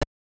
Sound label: Jingle; madou monogatari ii arle 16 sai; game gear